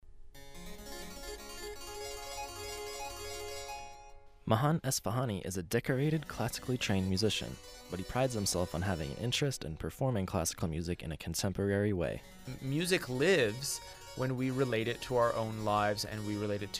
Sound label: harpsichord